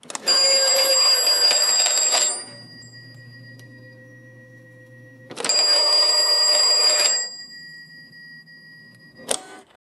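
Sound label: old fashioned cash register, retail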